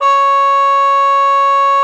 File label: C#5, TRUMPET; Best Service ProSamples vol.42 - Session Instruments; PS-42 Session Instruments